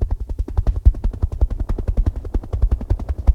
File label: helicopter motor; biofuel